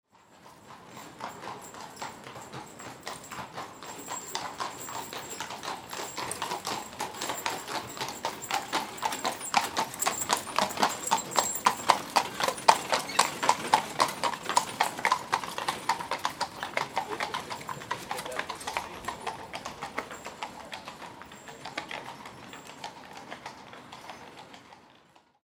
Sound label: horse